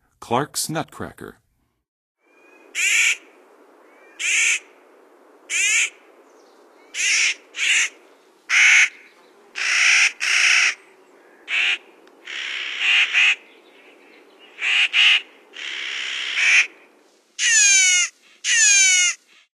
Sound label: Clarks Nutcracker; North America; Western USA; Western USA Songbirds